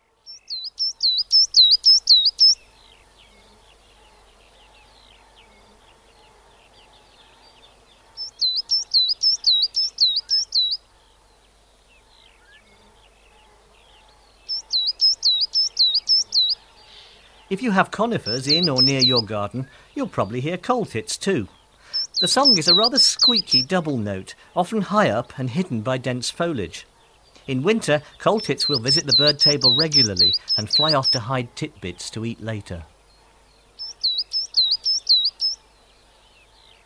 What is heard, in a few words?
Birdsong Coal Tit